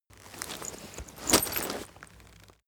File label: leather metal handling long